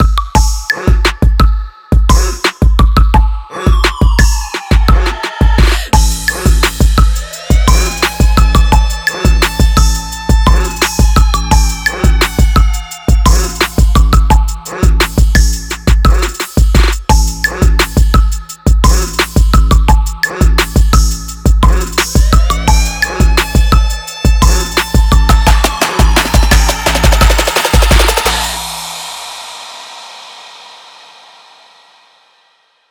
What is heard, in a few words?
rnb; By Burak Sound Bank; r b hip hop rap tema paketleri vol 1